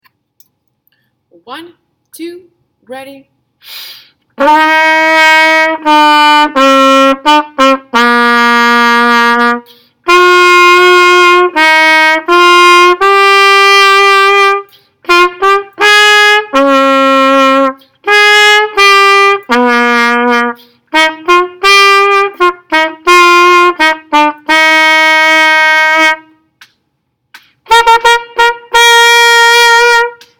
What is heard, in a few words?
trumpet